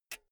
pull out jack cable; objekte; elektronik

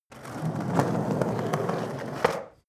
skateboard indoors drive heavy; sport freizeit

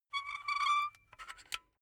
silicone gun empty pump slow; berufe; handwerker; soundpool

